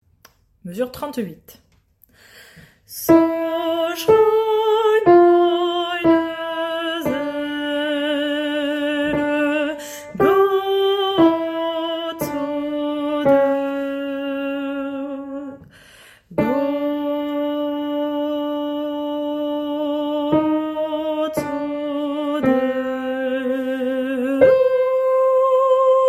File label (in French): alto; Suite psaume 42